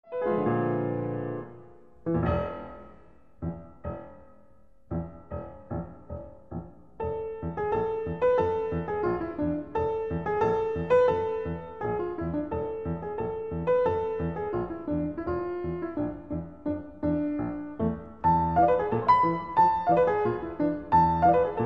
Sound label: violin piano masterpieces